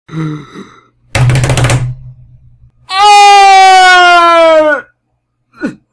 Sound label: underpants scream; sans